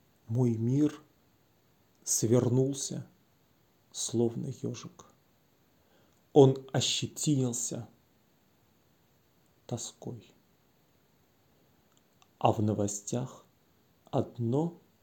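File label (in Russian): plach gitari, guitar crying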